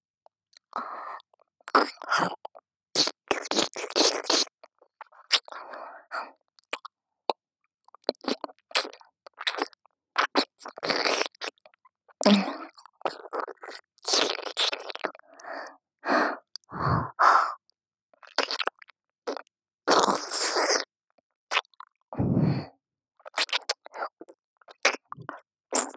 右耳舐め (ループ); 穹; SEあり

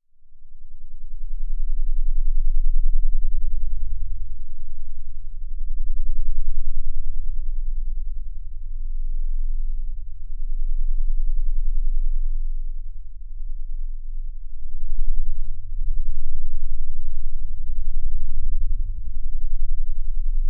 媚薬音源 15Hz 20Hz 5θ

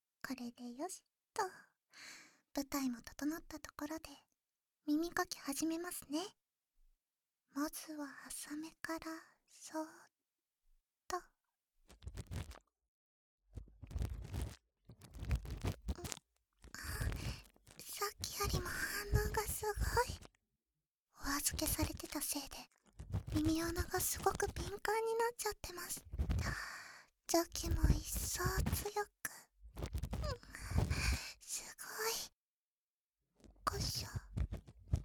【右】浅め耳かき

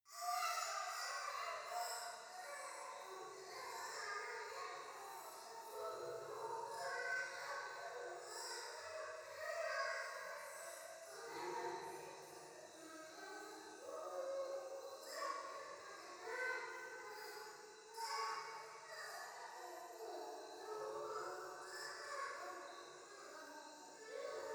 夢の中 シャブ喘ぎ 山奥夜

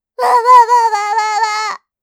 システムエラー

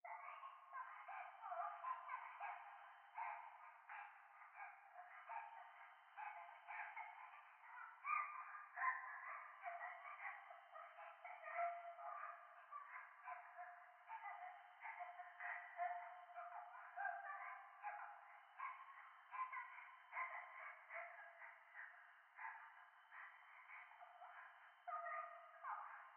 教会 可愛い喘ぎ 山奥

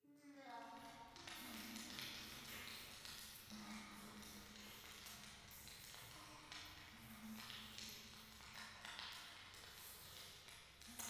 教会 フェラチオ 暖炉

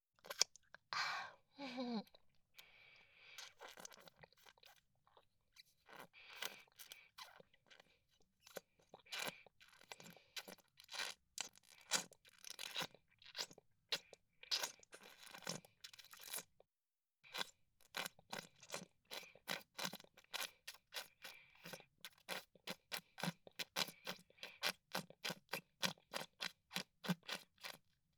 フェラチオ; SEあり

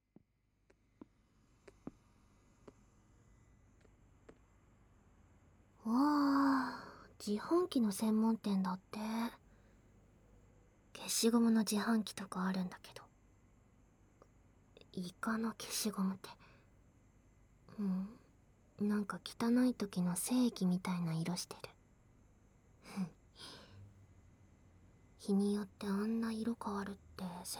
キス; ショッピングモールキス

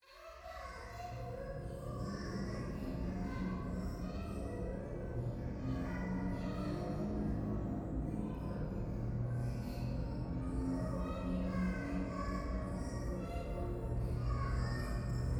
可愛い喘ぎ 車の中; 夢の中

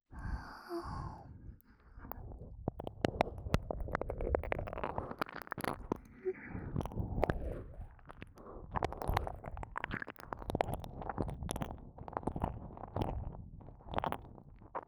耳舐め(左)-ループ

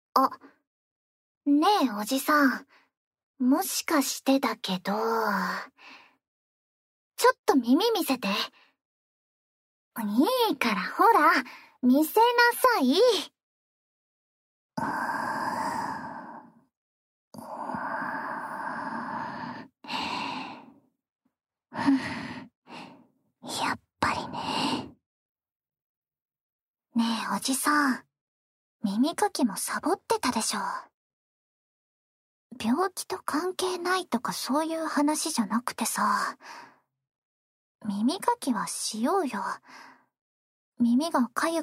耳かき, ASMR; おじさん……耳かきもちゃんとやろうね……？

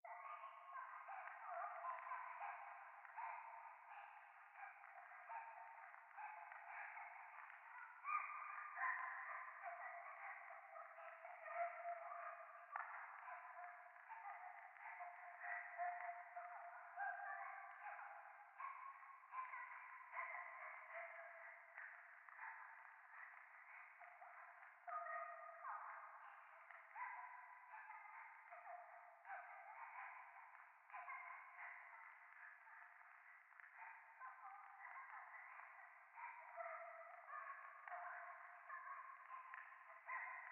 廃墟 可愛い喘ぎ 暖炉

